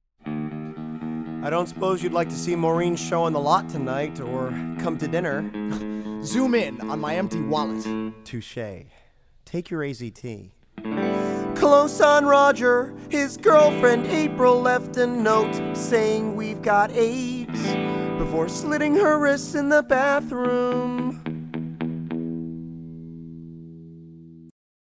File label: Tune Up